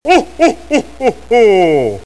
Am thanh ong gia noel cuoi

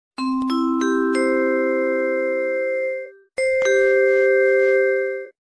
tieng tung tung tung cua hang

